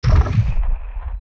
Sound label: Platform Ascend Stop; Jabu Jabu